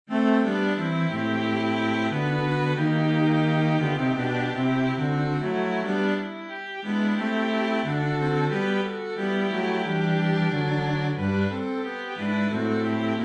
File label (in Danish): Hele partituret